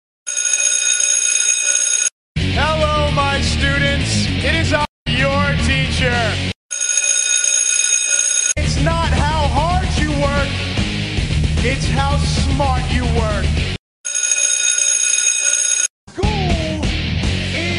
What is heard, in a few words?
My teacher laughing